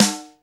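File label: SNR, snare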